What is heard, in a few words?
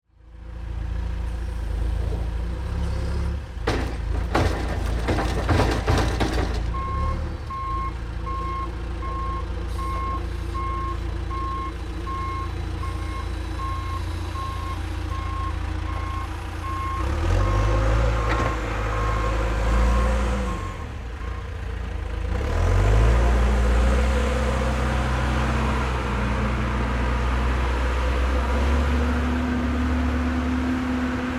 Crane Activity, Industry, Construction, Crane, Activity, Bucket, Dig, Dirt, Ambience